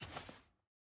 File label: ui generic section paper click